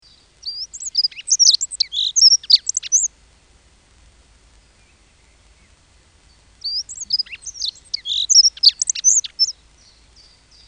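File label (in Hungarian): Erdei szürkebegy; Madarak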